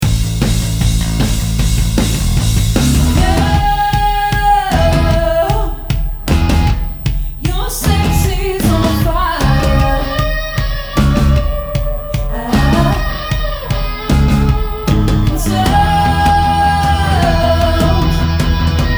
Rock Pop Band; Staffs

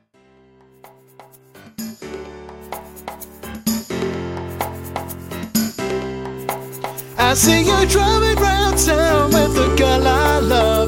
Rock Pop Band; Surrey

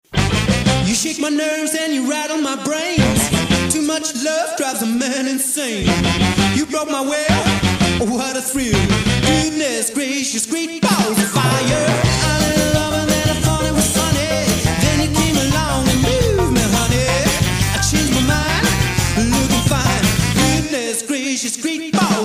Rock n Roll Band, North Yorkshire

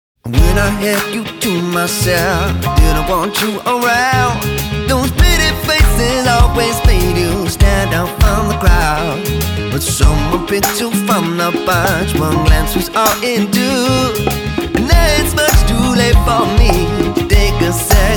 Rock Pop Band; West Sussex

